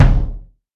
Big Room Kick Sound C Key, Royality free bass drum tuned to the C note. Loudest frequency: 226Hz